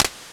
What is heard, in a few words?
Clap, Dubstep One-Shot Drums